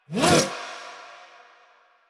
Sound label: Bullet Jump Pre Land, Motorcycle; Motorcycle Ninety Nine